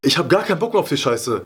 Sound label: hurt; spackenmobs; apored